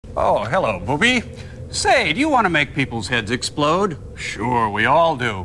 explode

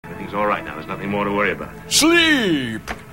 sleep

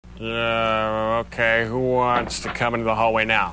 hallway

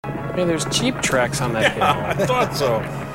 jeep tracks

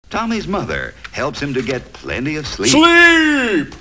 sleep

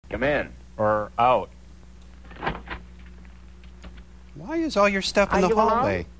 hallway

